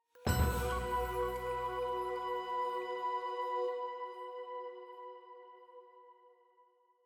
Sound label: item Jingle Full Choir Flute B